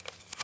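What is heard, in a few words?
footstep bare stone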